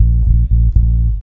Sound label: BASS LOOP, Ragga & Dub Reggae; USB Soundscan vol.27